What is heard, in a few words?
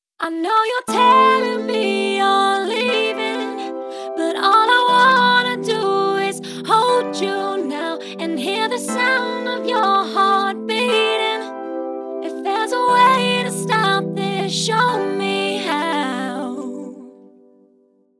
autotalent fast tuning